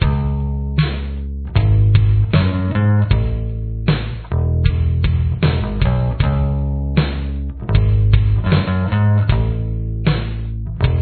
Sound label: bass